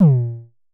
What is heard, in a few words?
Festival Kicks - A#2, Kicks, Vermona Kick Lancet; LookIMadeAThing Kicks